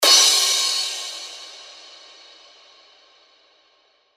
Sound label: Crash, cymbals; revealed cymbals vol 1